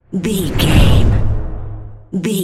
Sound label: cinematic deep low whoosh impact